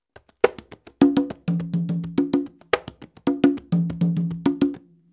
montuno, congas

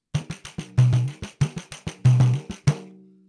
Surdo variation

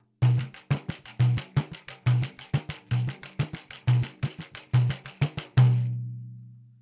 Caixa, pandeiro

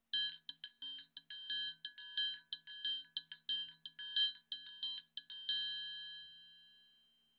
Triangle samba

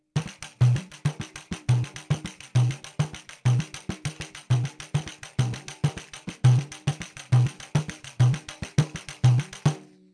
Bossa nova, pandeiro

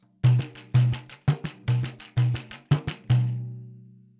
Caixa

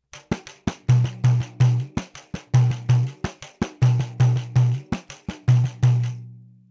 Cuica

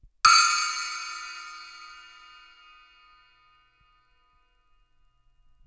custom splash 6', cymbales

